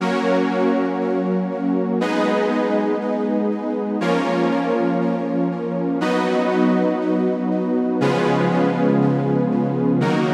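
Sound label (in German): Fis Dur Ges Dur enharmonisch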